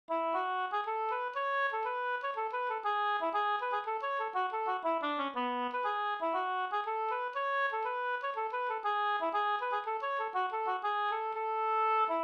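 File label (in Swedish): polska, A major; Arepolskan SvL JH1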